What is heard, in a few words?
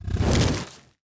dark mage summon skeleton